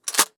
bark bark bark

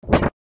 catch sound; bfdi

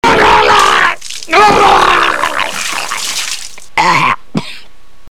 silly barf

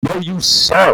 mmmmmmmmmm

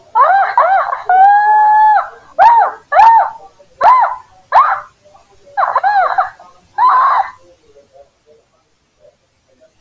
auuugh uhhah

